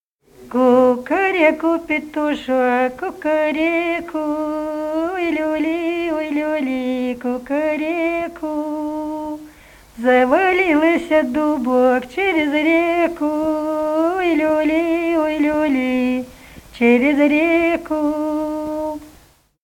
Кукареку, петушок (апрельская)

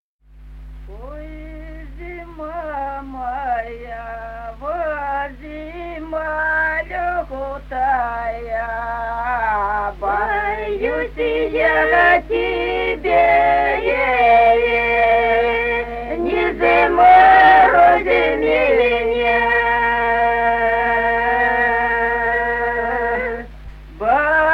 Ой, зима моя

